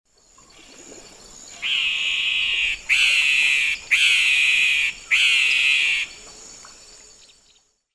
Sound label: Donacobius atricapilla, calls; S. America; Eastern Lowlands